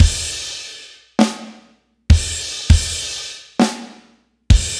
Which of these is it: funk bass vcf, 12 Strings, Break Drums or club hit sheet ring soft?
Break Drums